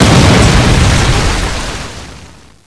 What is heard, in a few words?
explo; cstrike; codmw2